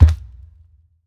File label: step; alien